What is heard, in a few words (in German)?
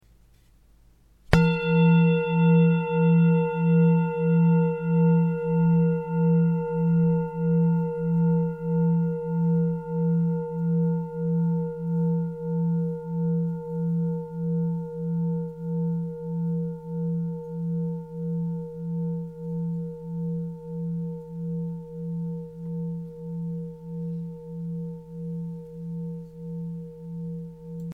Klangschale